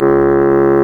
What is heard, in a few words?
REED C#; Big Fish Audio; Synclav FM